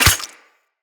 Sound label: Melee Dagger Impact Flesh